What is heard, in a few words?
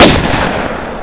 stechkin, weapons